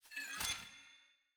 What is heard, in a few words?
ambience room witch lodge spell ready